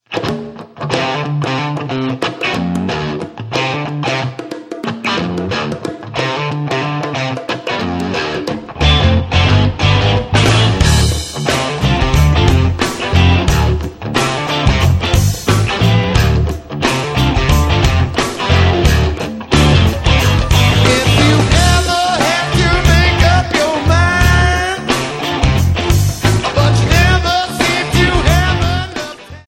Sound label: raw blues